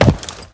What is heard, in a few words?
horse hoof; smite; guanyu